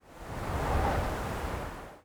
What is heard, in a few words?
wind gust; Counter Strike Model Pack; CS:GO